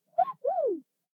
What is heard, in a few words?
alien whistle; prosoundfx